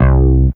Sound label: Bass C5, Fairlight CMI IIe; MikeBass